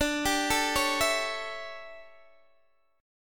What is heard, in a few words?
D Major 7th Suspended 2nd Suspended 4th D, Guitar, DADGAD